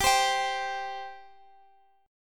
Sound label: C 5th, G